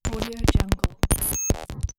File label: glitch; bogdansfx